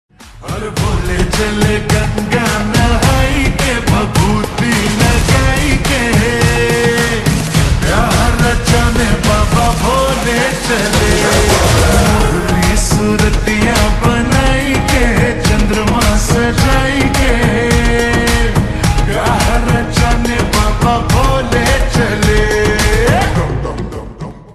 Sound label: Slowed Reverb Ringtone